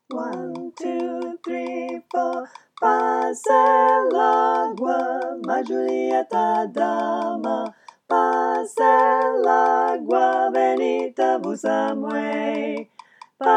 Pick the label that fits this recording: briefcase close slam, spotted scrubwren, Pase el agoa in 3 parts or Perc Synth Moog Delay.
Pase el agoa in 3 parts